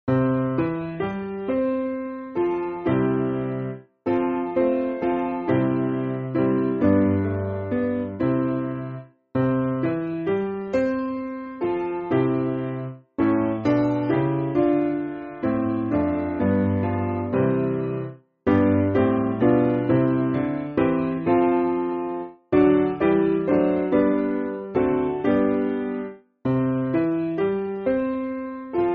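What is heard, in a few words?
Piano, Music Backing Files for Churches; Christ Is The Worlds; St Joan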